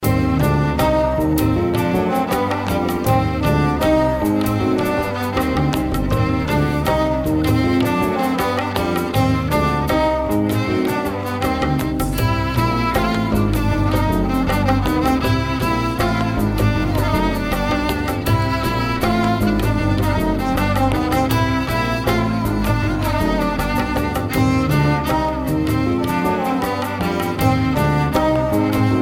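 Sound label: background music ringtone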